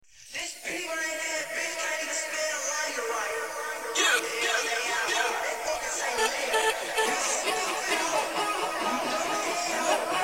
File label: vocals